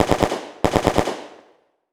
Weapon Machine Gun World War II Historical Double Burst; AdobeCC